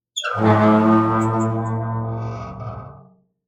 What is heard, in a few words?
Resonant Rusty Door ronk medium; Alex Lane